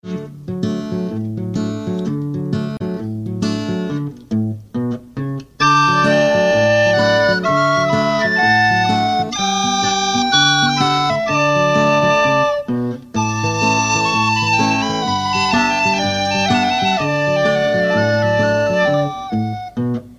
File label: False melody